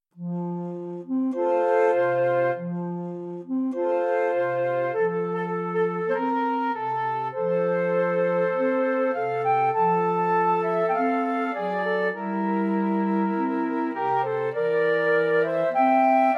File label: Tango In D